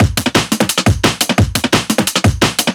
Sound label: DNB BREAK; m8 breaks